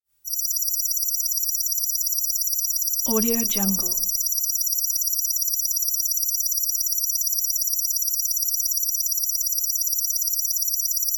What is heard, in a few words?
graphics beeps high pitch flutter tone bursts